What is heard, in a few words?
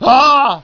pain; svencoop; decay